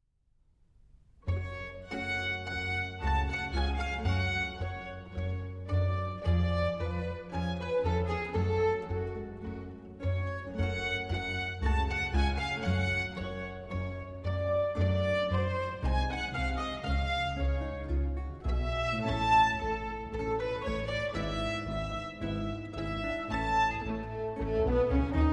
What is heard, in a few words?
Sinfonia For Strings and Continuo in G